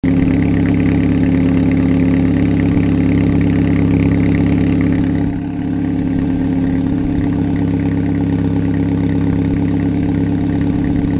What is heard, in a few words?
ding idle